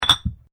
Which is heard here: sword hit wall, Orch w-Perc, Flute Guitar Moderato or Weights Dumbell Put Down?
Weights Dumbell Put Down